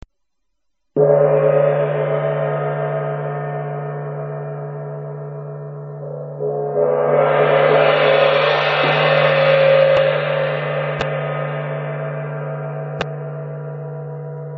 tamtam

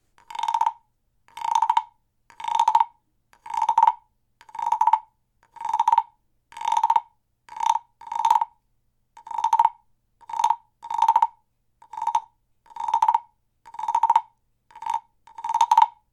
frosch gross

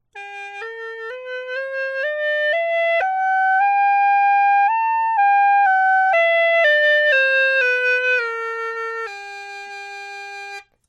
hulusi einfach hoch

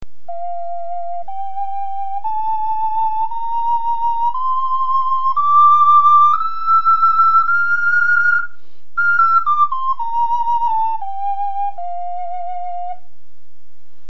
tonfloete mittel

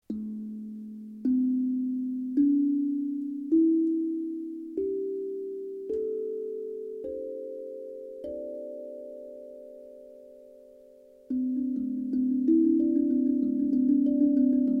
hapi d moll

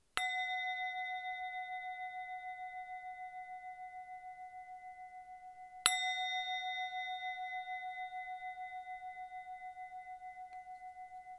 klangschale

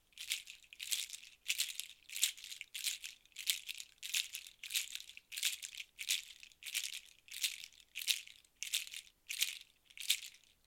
nussrasseln juju

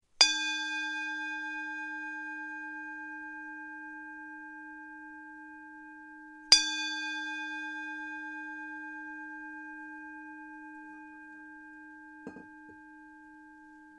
klangschale

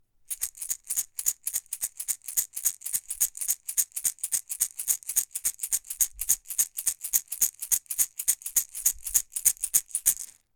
minishaker grob

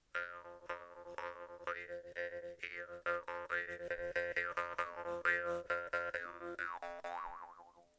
maultrommel klein

